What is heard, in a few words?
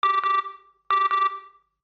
ring tone; price line